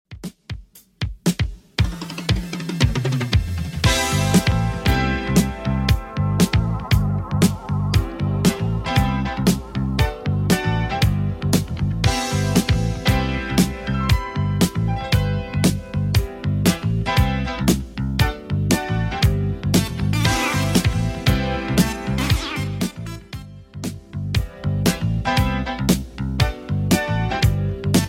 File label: 80s Redrum 117 bpm